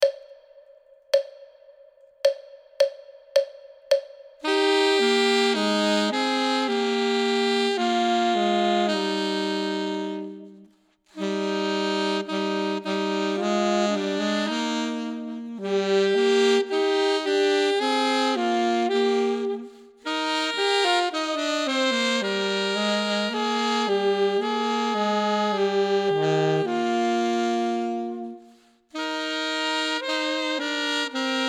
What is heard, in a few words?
duet; Ten Easy Duets